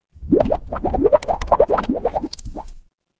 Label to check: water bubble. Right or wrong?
Right